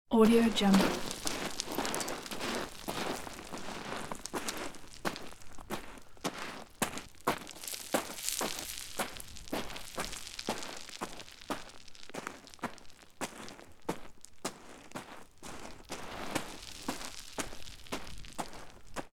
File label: walking on gravel field loop